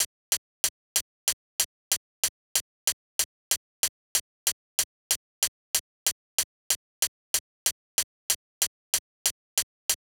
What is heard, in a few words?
Hat, 094 Emin; Hip Hop; big fish audio; Hip Hop Exotica; Apple Loops; Drum Tracks